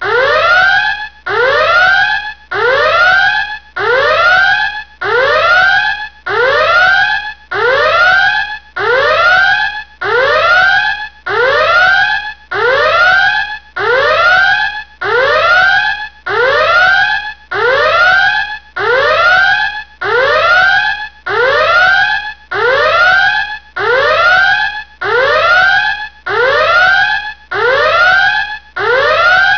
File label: alert, ambience